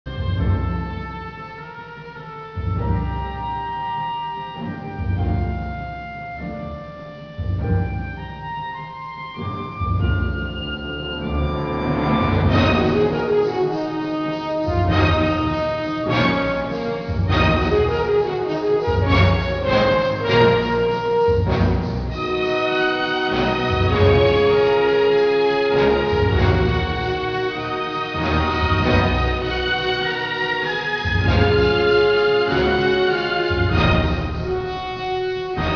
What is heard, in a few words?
instrumental